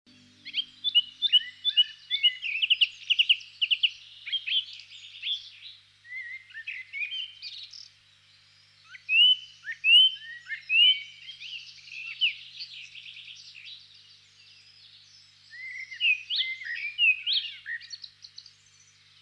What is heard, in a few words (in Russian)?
zvuki prirody penie ptic drozd